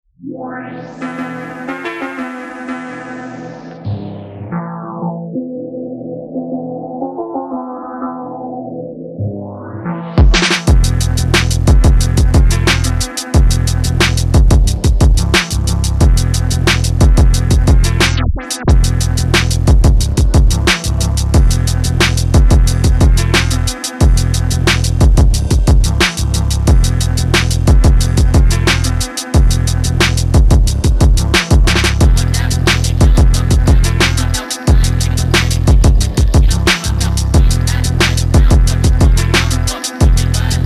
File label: phonk